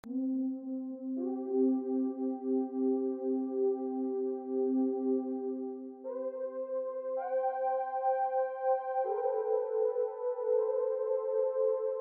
piece arrange pad

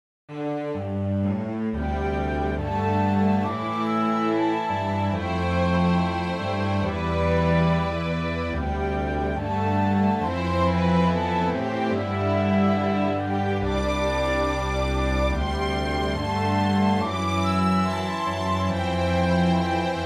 cello flute

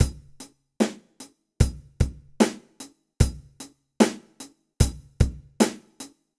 drum

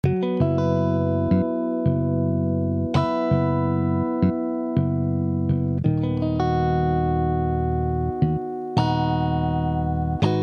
guitar

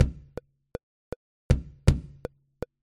kick

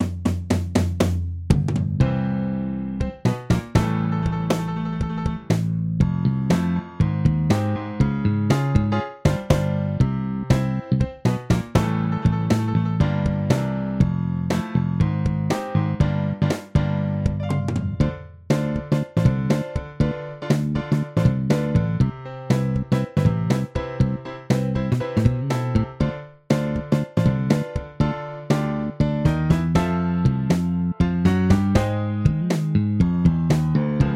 bass piano

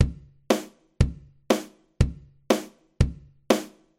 kick snare

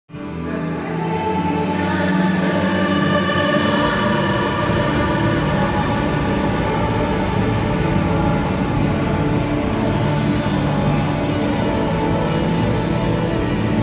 large hall

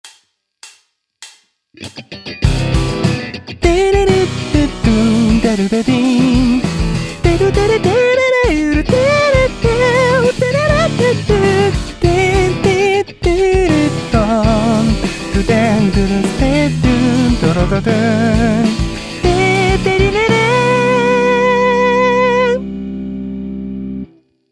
vocal mix comp

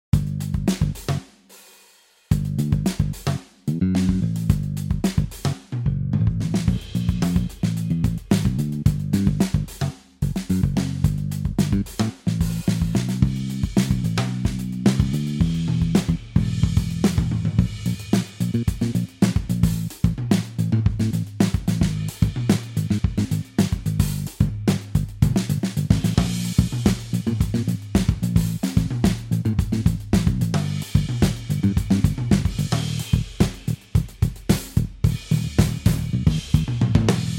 drum bass

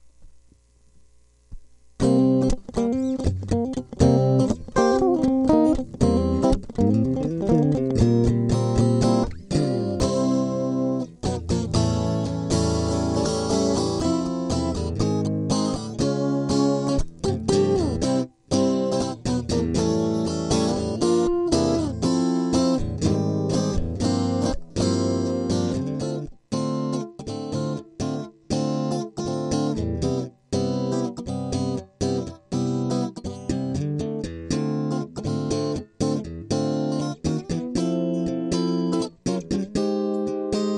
guitars

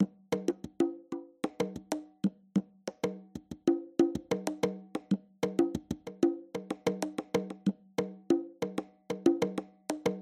conga before

